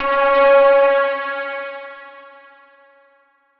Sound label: chime; chime sharp